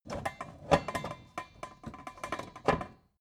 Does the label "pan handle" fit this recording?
Yes